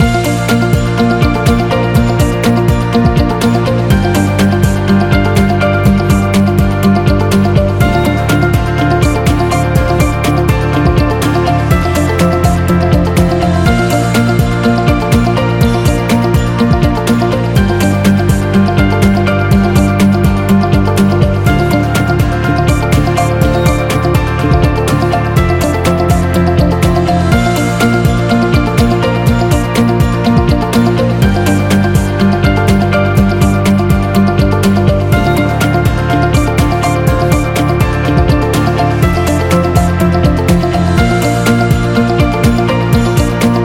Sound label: Documentary Loop; corporation